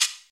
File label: raw shaker sound sample g sharp key, shaker percussion tuned to the G# note